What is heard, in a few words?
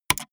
gaming mouse left click single fast hard